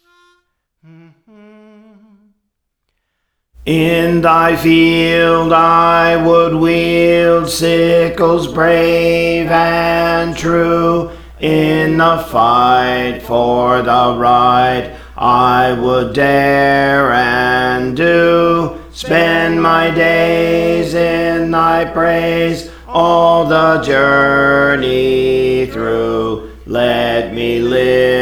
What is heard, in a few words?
Bass; Praise For The Lord Training; Let Me Live Close to Thee